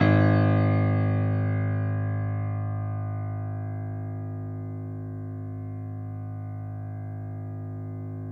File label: piano; East West; The Ultimate Piano Collection